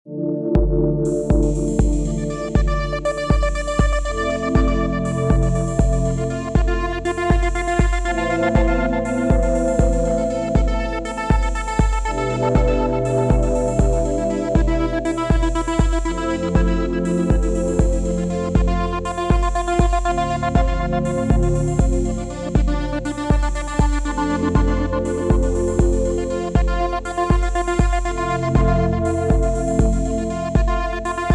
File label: nighum